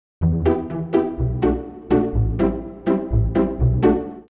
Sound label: pizz Strings